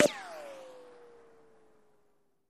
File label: Bullet Ricochet Sin